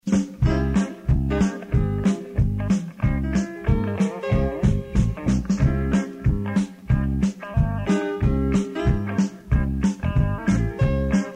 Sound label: country